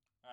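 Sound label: Hi-Hat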